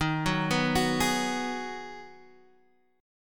Ab Minor 7th

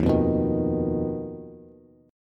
Eb Suspended 2nd Sharp 5th, Bass; Ebsus2#5 strummed; JGuitar

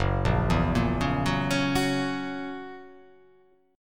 F sharp 7th, guitar

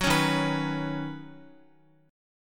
Eb Minor 7th Sharp 5th Eb down Guitar; strummed

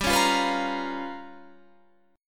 G 7th Sharp 9th Flat 5th G; strummed; 15,16,15,16,18,18

